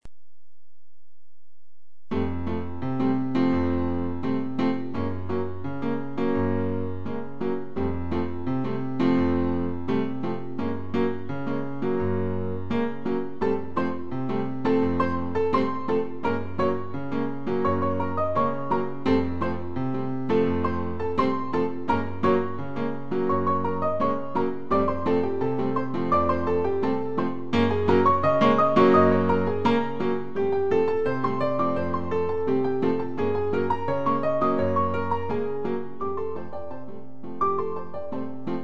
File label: Samba 170